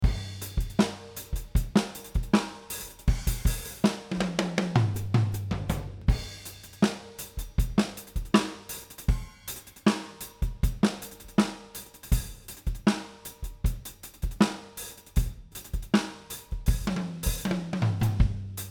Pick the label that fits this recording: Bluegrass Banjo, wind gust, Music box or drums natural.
drums natural